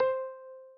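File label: Instant Messaging Contact Out; oxygen sounds; plasma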